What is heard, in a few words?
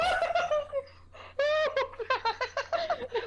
giggle bear